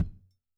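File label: dundun; ILIO - Ethno Techno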